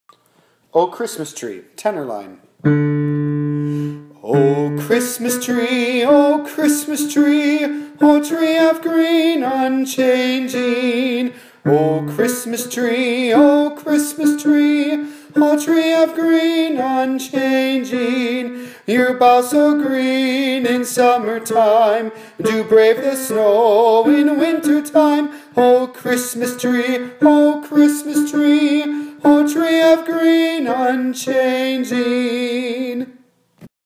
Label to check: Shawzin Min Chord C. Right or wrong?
Wrong